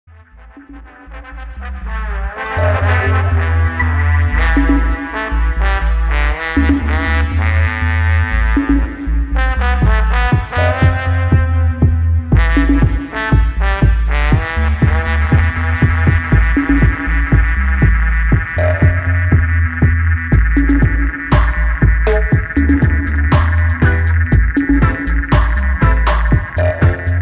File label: Mission Horns Dub